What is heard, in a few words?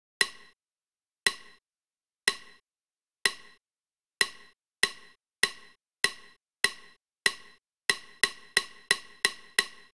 camera timer; SE W595